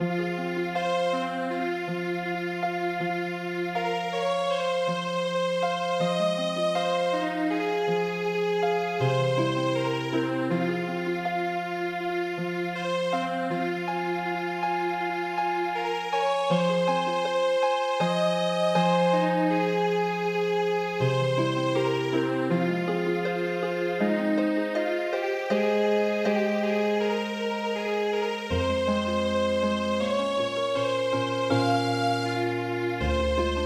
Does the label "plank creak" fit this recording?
No